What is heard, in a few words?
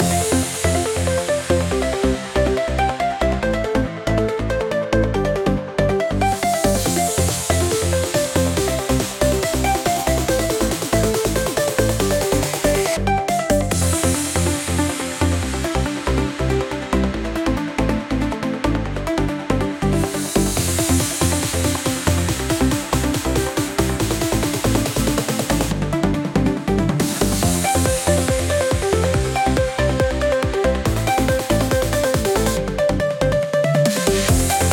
Drone Electronic